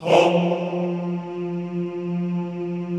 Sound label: HOMMM F2, Classical Choir; Best Service